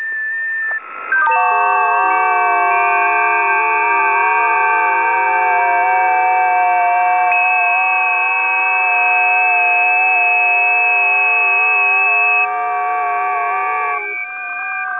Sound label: 20m busy; ft8